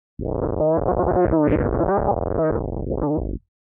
Cool Garbled Transmission; Communication Sound Effects; electronic sound effect

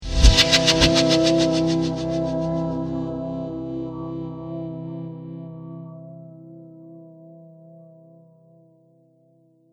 Dissonant World Trailer Hit

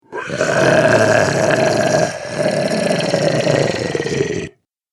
Zombie Gurgle Growl; Zomby Sound Effects; horror

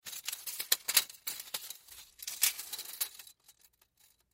Tape Measure Move, Tape Measure Rustle; Hand Tools; INDUSTRY

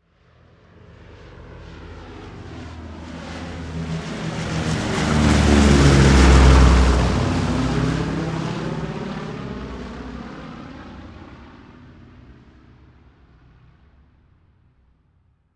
Vehicle Airplane B-17 Bomber Pass By Military; Airplanes

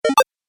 Game Pause, video game

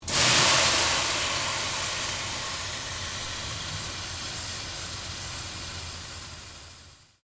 Water Sizzling Very Hot Pan; Heated water sounds; Kitchen; Sound Effects:Household

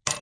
Ice Cb Thrw One, Ice cube thrown in glass.; Drink Mixing (Sound Effects:Bars)

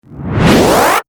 Charge Up Whoosh; Whoosh Sound Effects; sound design

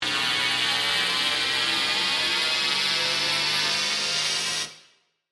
Power Up Electrical, Scifi, Ascend; Science, Fiction; Spacecraft Sound Effects; BlastWave

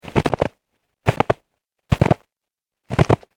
Snow Foot Step Collection

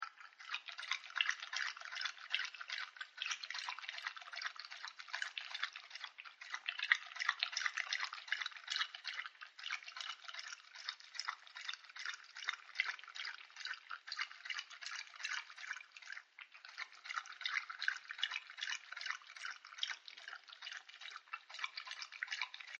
Dog Drinking; Dogs; Animals; Partners In Rhyme

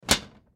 Cabinet Door Close Glass; Furniture; Household; Cabinet Doors; Blastwave

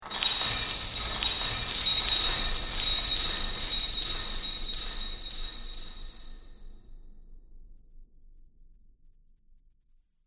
Metal Chains Magic Ambience; Chains Sound Effect; Clink, Clatter, Clang, And Clink

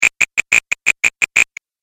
Arcade Game Wonky Buzz Box; Video Game Sound Effects; Retro Inspired Sound Effects - Think Nintendo, Atari, C64

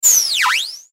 Whoosh Short Phase Descend Ascend; Blastwave; Whoosh Sound Effects

